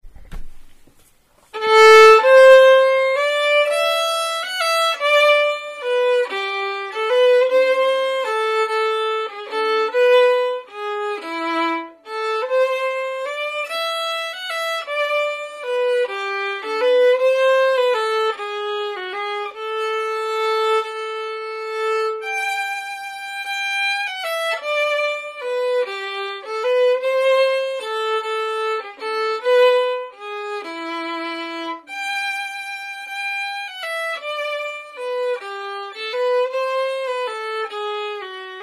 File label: melody, violin only; Greensleeves; duets for violin only